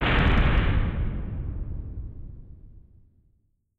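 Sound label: Collision Interior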